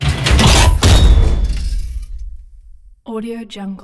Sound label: attack defense maximus metal knock out; robotic lifeforms 2